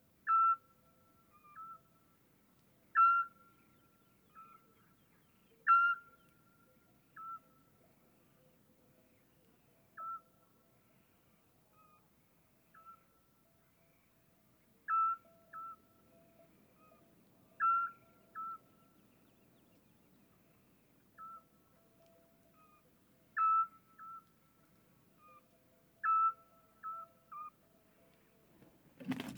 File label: Eurasian Scops Owl Copulation sequence with various calls of male female